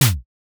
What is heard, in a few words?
snow step soft